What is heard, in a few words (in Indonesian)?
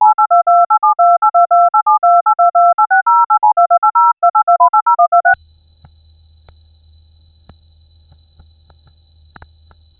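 bunyi telepon studio berdering, studio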